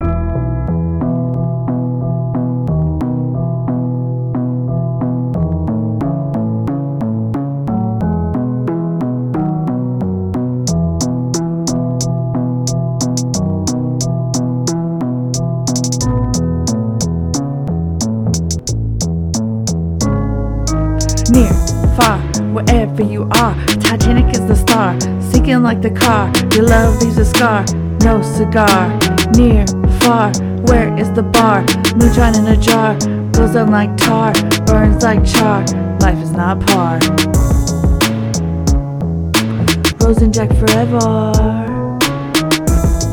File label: rap; titenic